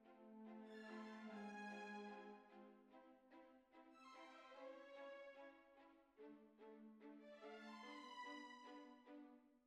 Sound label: Flute